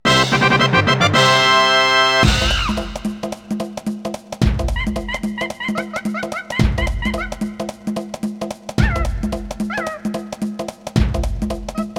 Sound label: Win Race; Donkey Kong 64